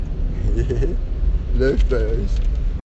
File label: hehe